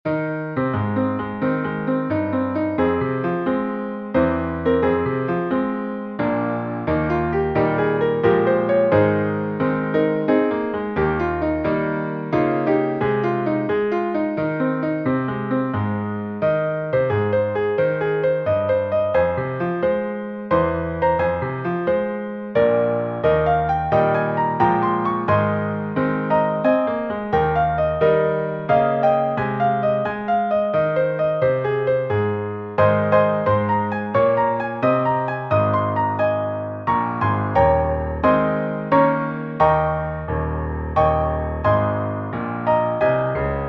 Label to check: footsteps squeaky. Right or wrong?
Wrong